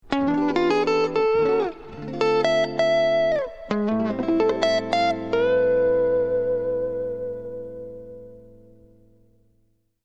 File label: lead chord end, guitar